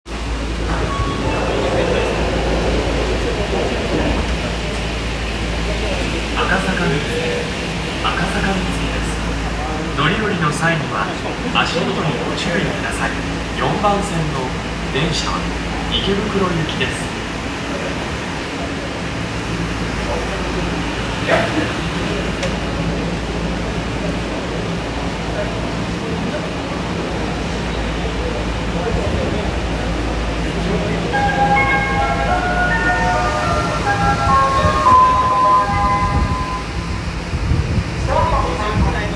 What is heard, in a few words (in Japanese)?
丸ノ内線赤坂見附東, train sounds